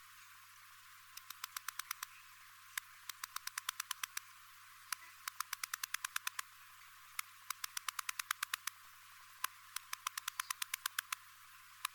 Barbitistes constrictus

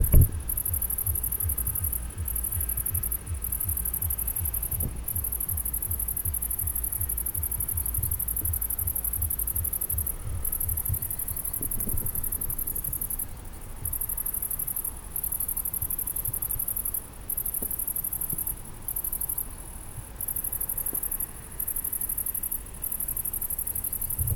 Tettigonia viridissima; Grünes Heupferd; Laue, Rekultivierungsbereich; Grünes Heupferd (Tettigonia viridissima (Linnaeus, 1758))

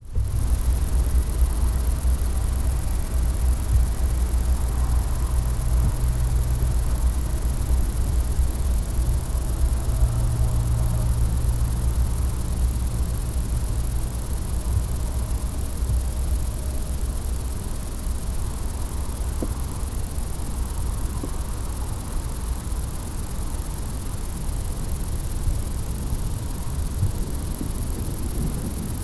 C fuscus, Conocephalus fuscus

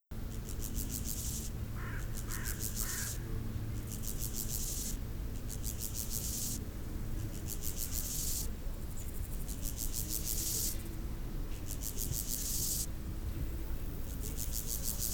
C dorsatus original

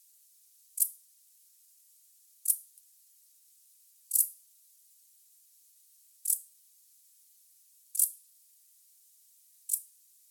decticus verr, Decticus verrucivorus

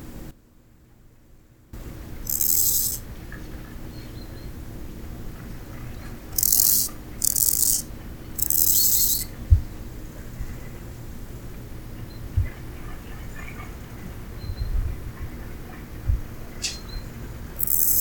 C dorsatus

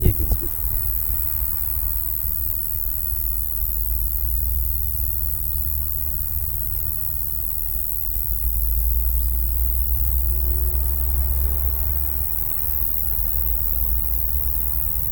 R roeselii, Roeseliana roeselii

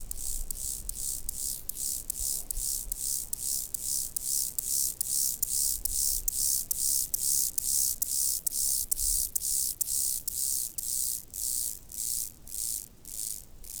Chortippus mollis

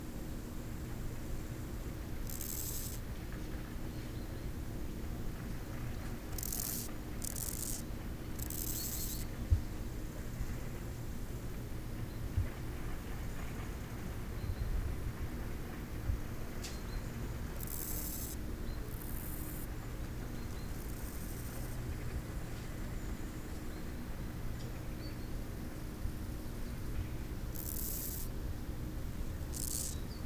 C dorsatus

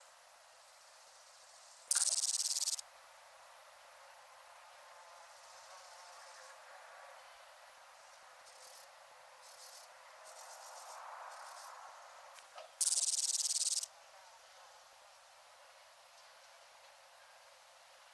Stenobothrus stigmaticus

